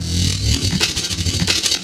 LOOP FX -L, 168 BPM Drum & Bass; Ueberschall; Drum & Bass Resonance

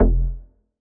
Bass F, Bass Synth

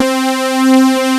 SUPERSAW, synths; Pro-Rec - Synthsonic

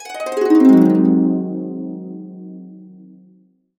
AB, Harps; USB Soundscan vol.62; AKAI

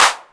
Old 808 Klap; Zero-G; Soundclash

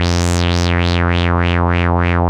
WAH WAH F2; Analogon; WAH-WAH I

